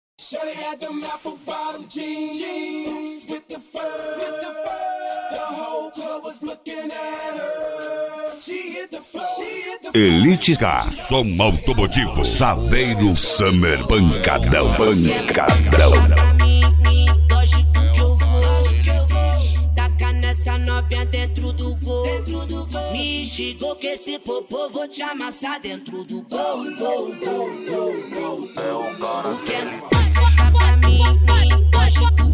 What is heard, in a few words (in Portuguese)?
som automotivo; elite car